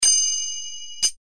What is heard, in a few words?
tisra jati, kartal